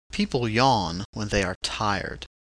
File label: yawn